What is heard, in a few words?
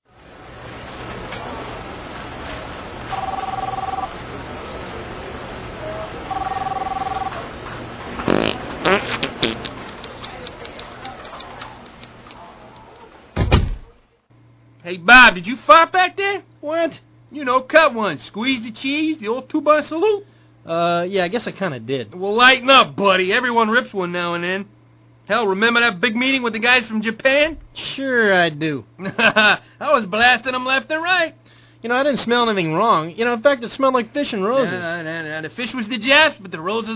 Fart Pads